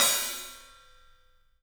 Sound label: TPAH14, 3D Drums, LITE TIPAI H; 3D Sound